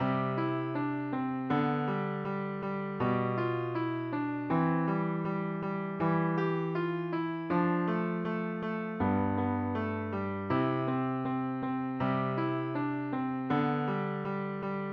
guitar

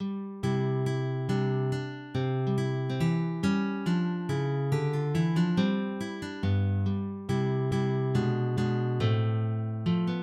andantino, guitar; Ferdinando Carulli

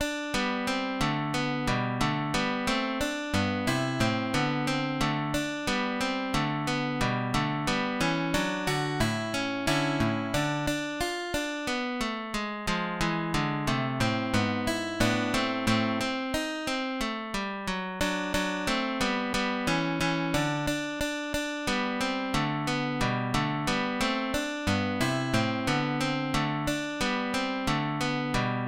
andante grazioso, guitar; Ferdinando Carulli; grand recueil

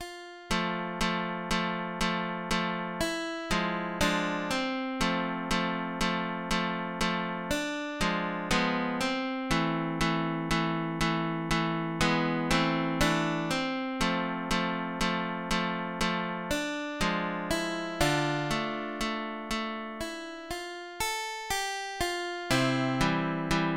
Nocturne in F, guitar

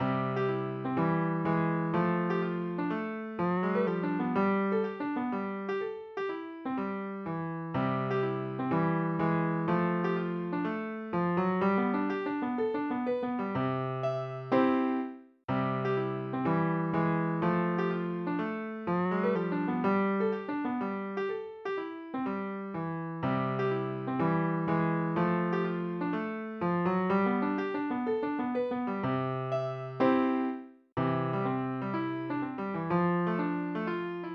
clog, guitar; celebrated diamond clog

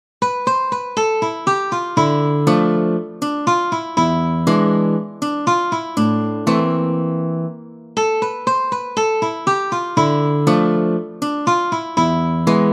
tango; fortea; daniel fortea

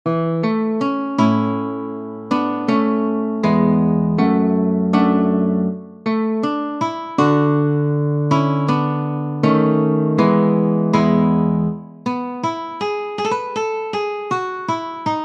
Allegro maestoso, guitar

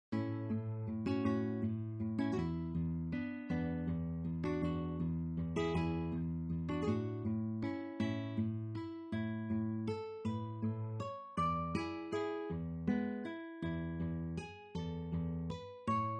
waltz, guitar

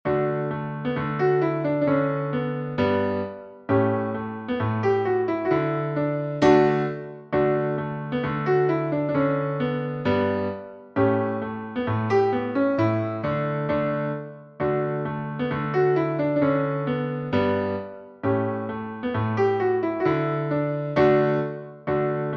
schottische, guitar; cheerful schottische

